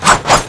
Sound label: knife slash; Turbulent